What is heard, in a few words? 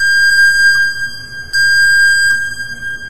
jungle alert; Source Engine; tf; lair